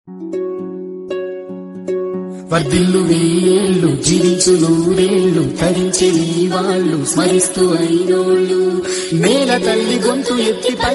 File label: telugu song; subhakankshalu